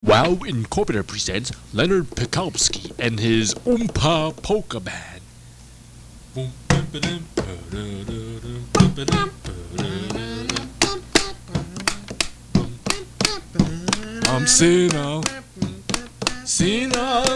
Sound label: Polish Polka